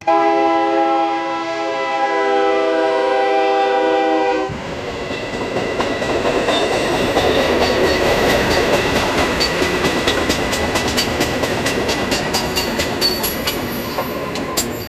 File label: train coming with horn